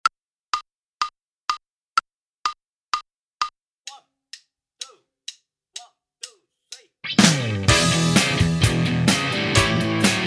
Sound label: Minus Bass; Inst Ensemble